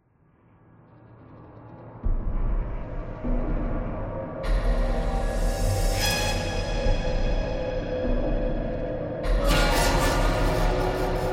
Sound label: Tension